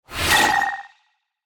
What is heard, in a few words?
Magic anime spell cast